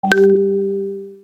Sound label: Whatsapp Web Tone